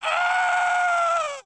Scream; Warzone

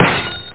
smash; space case

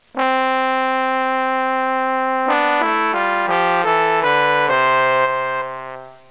trombone; Music Game

